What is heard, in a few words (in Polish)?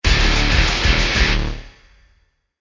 Walka Sredni; Shadow Warrior 2 gamerip 2016